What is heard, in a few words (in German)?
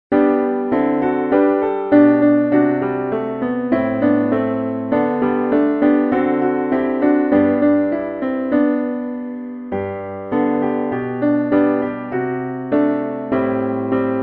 Alles Klavier; Laer mig bedja